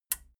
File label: metal button radio push power on